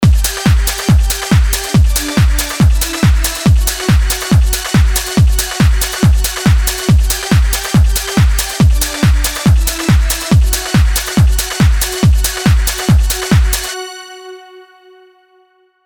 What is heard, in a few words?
FX Side Chain Strings